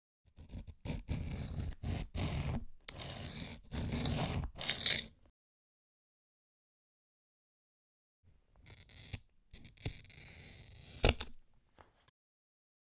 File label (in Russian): Steklorez